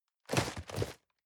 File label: cardboard box medium turn hard short; objekte